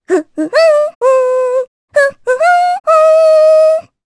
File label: Hum, jp; Rephy; kingsraid; voices heroes